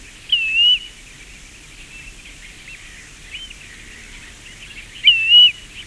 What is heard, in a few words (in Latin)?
Pluvialis squatarola; pticy; kulik